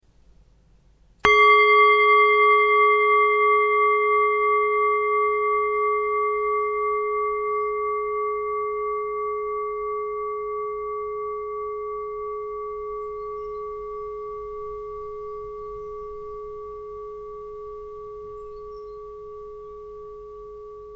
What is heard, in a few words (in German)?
Klangschale